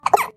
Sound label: Hiccup bent